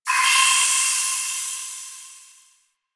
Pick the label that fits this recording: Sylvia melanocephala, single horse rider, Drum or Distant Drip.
Distant Drip